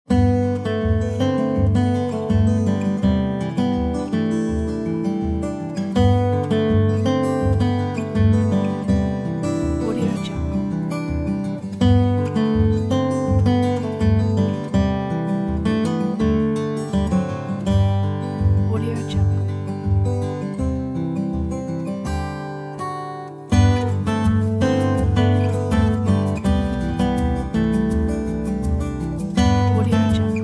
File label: uplifting sentimental acoustic folk; applehillstudios